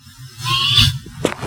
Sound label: Hawk